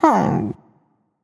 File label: growls